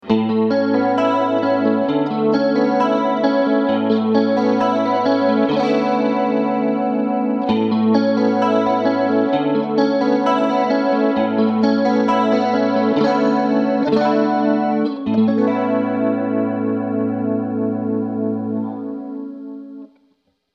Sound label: CHORUS